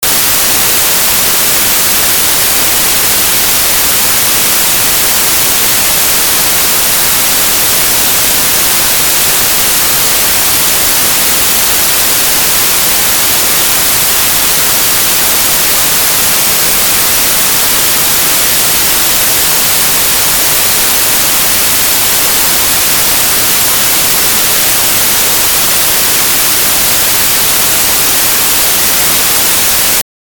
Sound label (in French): bruit rose